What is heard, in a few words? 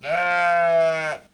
sheep